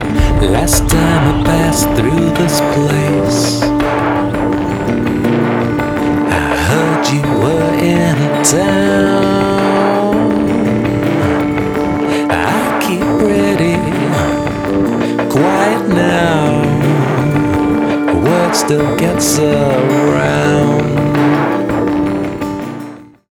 Vocal Verse Context

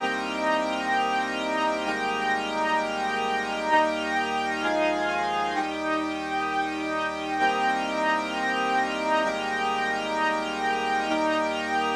Pad Wide Flanger

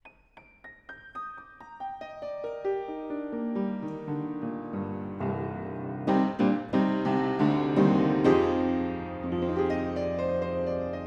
Piano, Panels Off 50cm, Spaced Omnis 80cm Lower

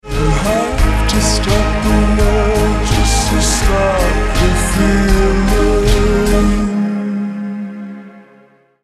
Pitch Drop

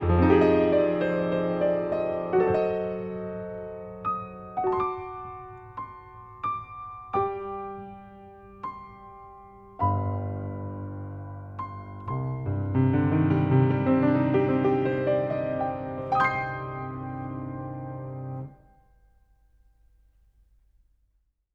Dual Array Facets Piano Mix

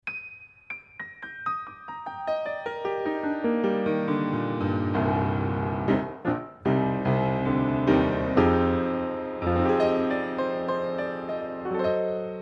Piano, 30cm Cardioid Pair Lid Off

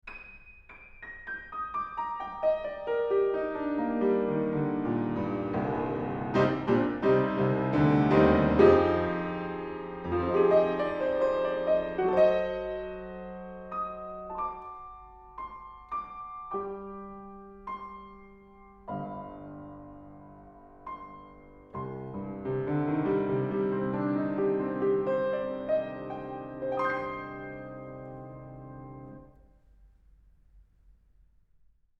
Piano, Horiz Off Mic

